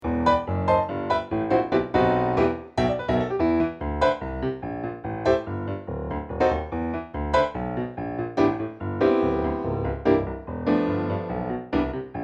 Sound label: Practice Track – Accompaniment; advanced satb honor choir